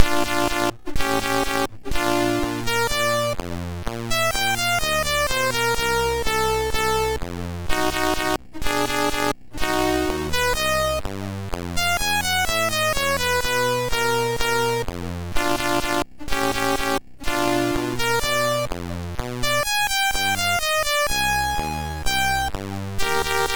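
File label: Pac Mann, MOS 6581 SID chip music, games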